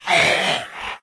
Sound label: zombie agg